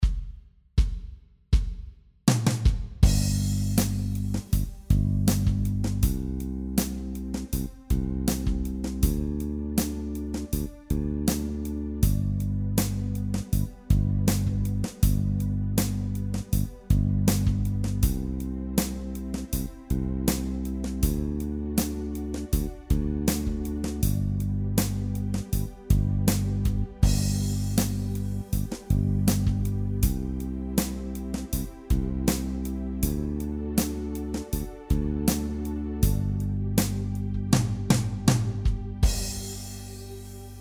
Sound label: Groove Jam Track